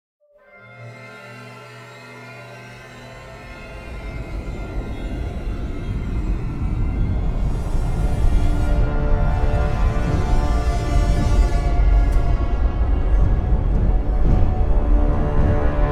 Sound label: music ambient agressive; gemini wars windows gamerip 2012